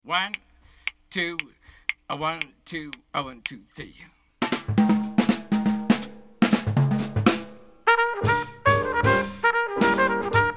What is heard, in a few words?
med up minus Bass